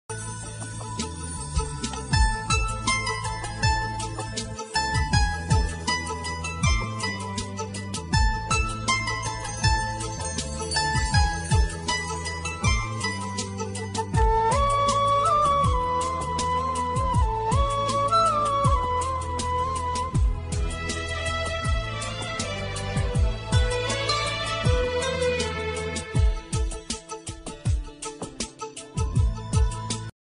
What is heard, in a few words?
movie bgm music